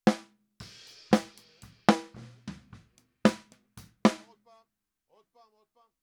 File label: SNR